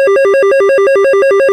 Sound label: missile; 3D-Airforce-Simulator